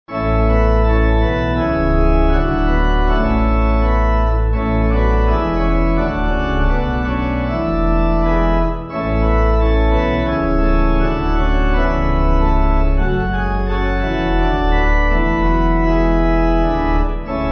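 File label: We The Lords, Pipe Organ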